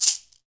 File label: SHAKER, Afro Shakers, Shake&Rattle